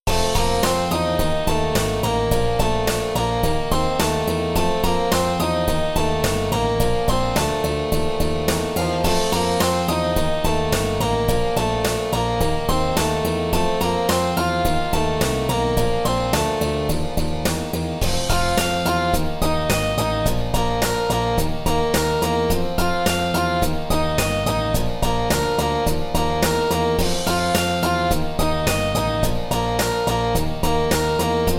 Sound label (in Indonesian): Nada Dering Nokia Jadul; Roll on